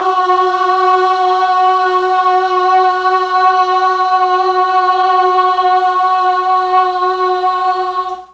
F#2 BOYS CHOIR, Mellotron; Mike Pinder - Mellotron Archive CD

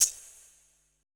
E SHAKER, ETH PERC

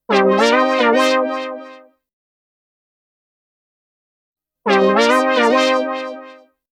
105 Cmaj SUGARFUNK SYNTH; 105 BPM

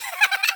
Laughter; mt-32

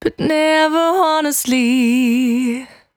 Cry 68bpm B, Vocal; Zero-G

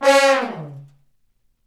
BRS, Fat Falls; Roland L-CD702; Fat Section

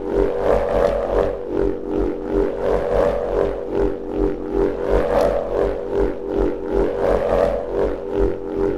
Wind Wand Drone - Pulse, Ethnic Percussion; Sonic Foundry (Sony Creative Software); Ambient Atmospheres & Rhythms

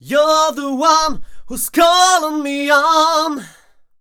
m 128 e-flat, Vocals

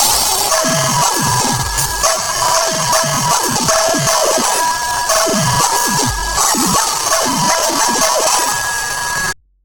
Lo Fi, Lounge Drumz

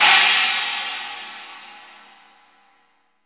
CYM CHINA

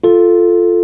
RHODES CHOR; PlatinumPhattCD1; E-MU Producer Series Vol. 8 – Platinum Phatt; Best of Phatt

